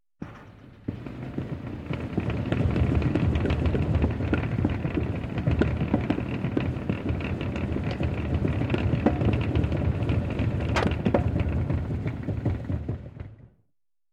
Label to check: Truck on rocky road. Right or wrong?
Right